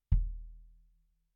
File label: BD In